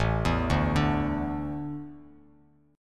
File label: A Suspended 4th, Bass Standard, Asus4 chord; Asus4 arpeggiated; JGuitar